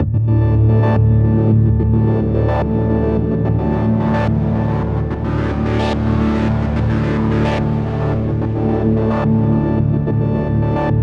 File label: Pad, PsyTrance